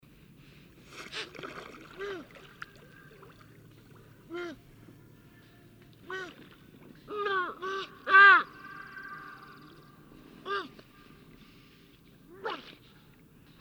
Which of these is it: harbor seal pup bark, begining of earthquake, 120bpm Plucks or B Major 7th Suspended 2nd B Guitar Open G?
harbor seal pup bark